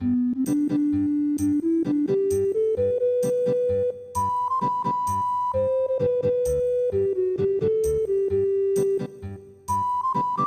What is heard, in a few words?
foreign guiter